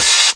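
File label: Yamaha RS7000 hihat cymbal, Drum machines; Cymbals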